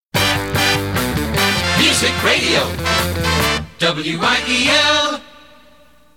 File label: jingle